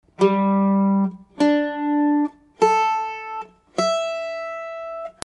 bandolim tuning

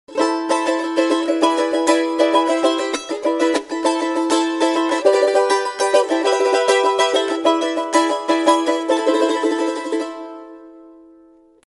CHARANGO

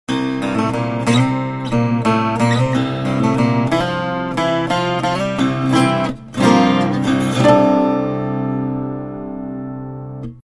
LAUTO

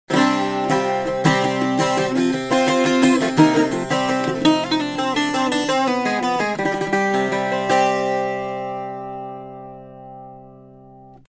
BOUZOUKI

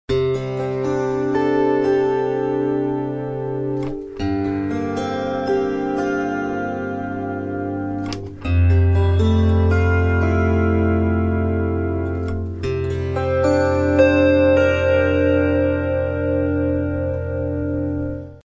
kantele

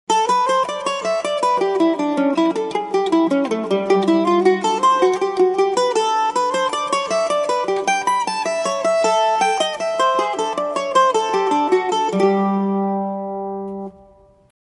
bandolim

